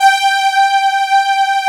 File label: STRG G4; East West; Phat & Phunky